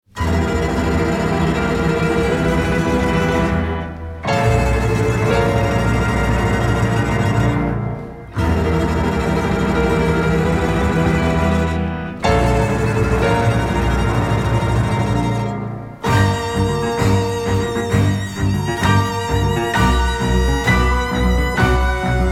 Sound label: Fanfare